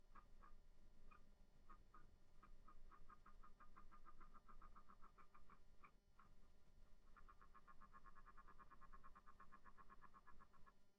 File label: squirrels loft noise